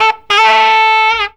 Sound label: FUNKY SAX; Zero-G; Funky Elements